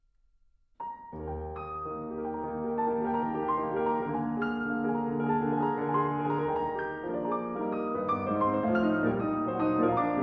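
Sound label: Etude op 10 No 11 in E flat Major Allegretto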